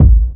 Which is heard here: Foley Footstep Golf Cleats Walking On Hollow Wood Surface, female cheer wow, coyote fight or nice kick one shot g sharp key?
nice kick one shot g sharp key